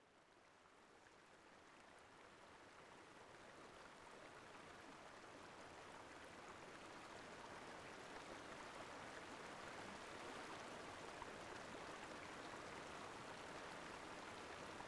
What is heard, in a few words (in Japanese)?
ASMR フェイスジェルマッサージ